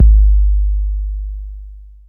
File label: 808 Kicks; 808 drum kit; puredata